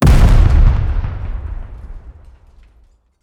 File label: explosion; out of reach treasure royale 2020 gamerip pc